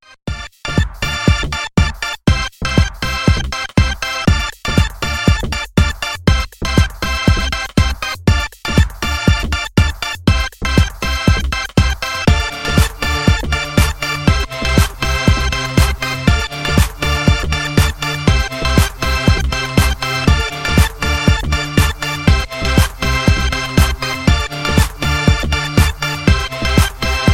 Electronic cold note; MIUI12

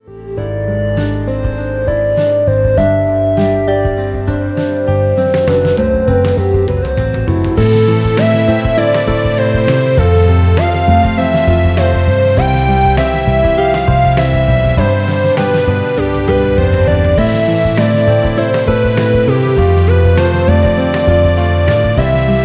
Have some jazz; MIUI12

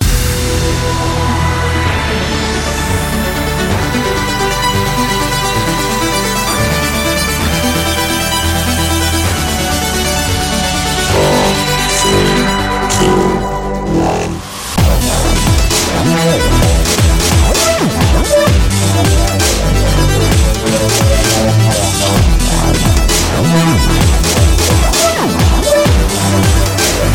RINGTONE; MIUI12

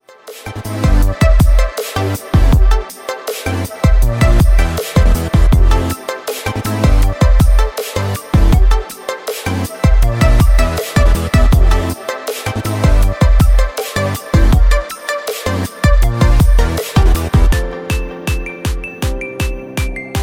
Electronic Progressive; MIUI12

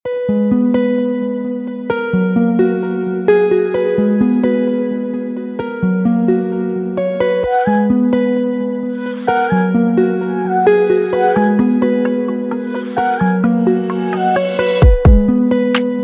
R&B; MIUI12

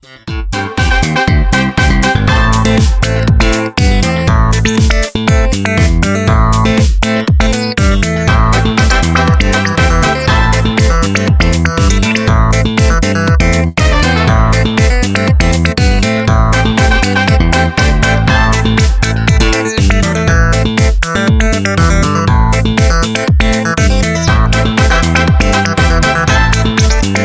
Dynamic FUNK, ringtone; MIUI14 and HyperOS 1.0

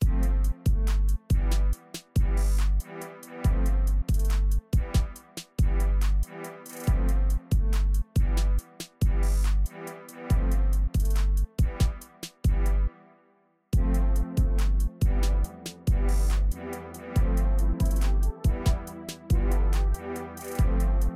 Trap Energetic; MIUI12

